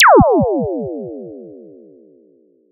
impulse response

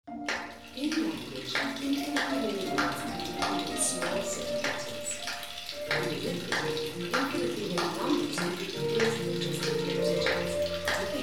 mix small reference

